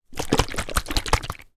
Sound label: household baby lotion shake in bottle